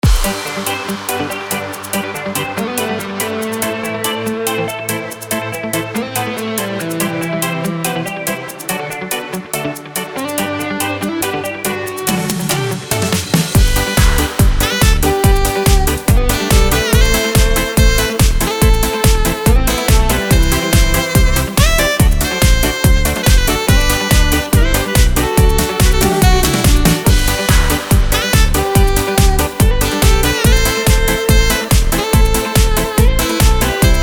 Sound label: Style Yamaha; yamaha psr s950